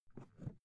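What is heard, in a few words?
briefcase open soft, Büroarbeit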